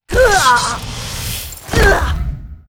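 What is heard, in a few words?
death; Aileen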